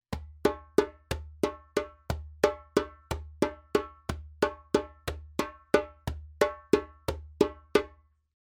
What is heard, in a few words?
djembe; djembebog oevelse